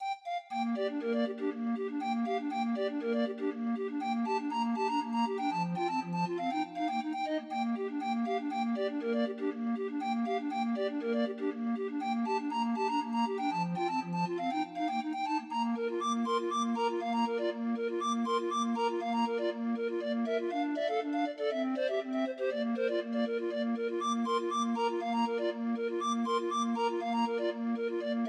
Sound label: Grind Organ, 20 note MIDI file